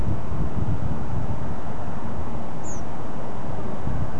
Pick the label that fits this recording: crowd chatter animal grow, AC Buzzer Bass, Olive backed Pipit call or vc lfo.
Olive backed Pipit call